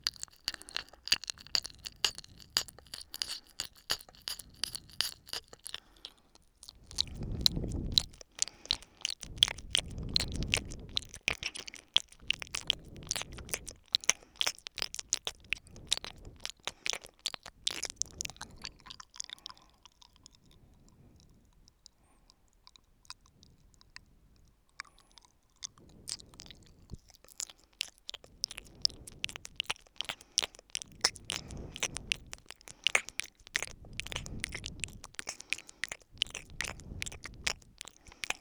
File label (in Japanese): 左耳深め